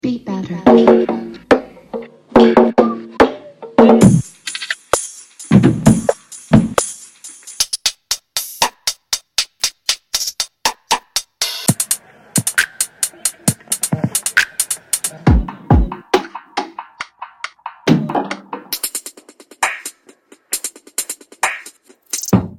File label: dirty trap drums; Beat Batter